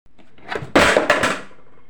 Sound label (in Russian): metallicheskij hit